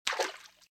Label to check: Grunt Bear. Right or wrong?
Wrong